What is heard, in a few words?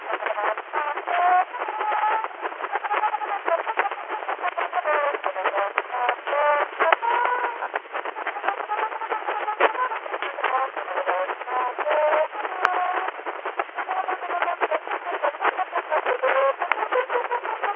Music filter